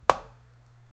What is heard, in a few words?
the sound of a dart